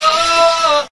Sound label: "oh", sung; Voice